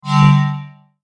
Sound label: Rainbow bar Full; Ui Camp Gacha Rainbowbar Full